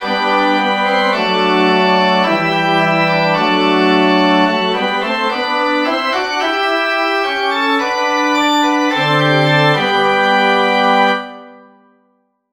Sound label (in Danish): forspil